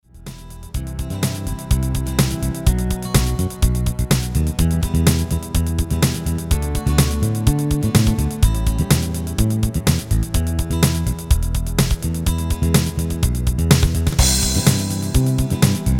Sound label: base marimba